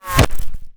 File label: dart hit, bullets; weapons